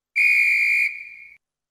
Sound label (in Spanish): arbitro futbol